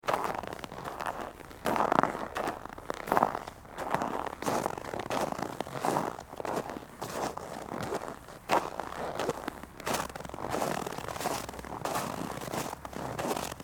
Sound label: walking sound snow